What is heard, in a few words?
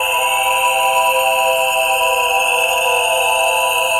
Nebula, пэд сэмпл в стиле deep house pad sample